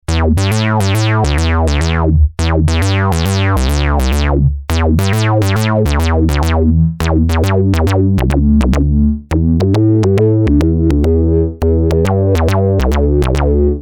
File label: 2 Envelopes